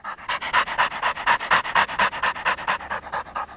pant, dog; Fate; MONSTERS

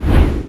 big swing; Fate Undiscovered Realms

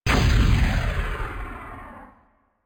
shot; Monster girl quest Part1,2,3; Visual Novels

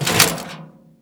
plate get; Fate The Cursed King; ITEMS

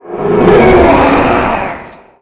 roar, spectre; Fate; MONSTERS

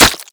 heavy flesh; Fate The Cursed King

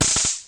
spark; Monster girl quest Part1,2,3; Visual Novels

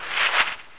escape; Monster girl quest Part1,2,3; Visual Novels

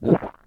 ero eat; Monster girl quest Part1,2,3; Visual Novels

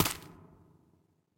footsteps nest; Fate The Cursed King

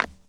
clop step; Fate

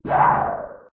miss wind; Monster girl quest Part1,2,3; Visual Novels

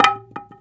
wood drop; Fate The Cursed King; ITEMS

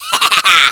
laugh, demon; Fate Undiscovered Realms; MONSTERS

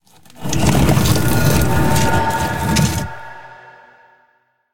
shadow elf doorway; Fate The Cursed King; doors

